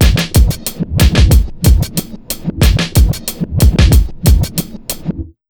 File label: 92 BEAT, Pure Electro, BEAT MIX; USB Soundscan vol.03; AKAI